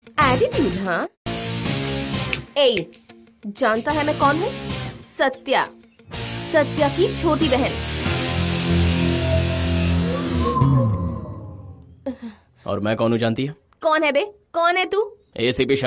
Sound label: kannada, attitude